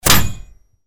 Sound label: bullet impact metal heavy; Out of Reach: Treasure Royale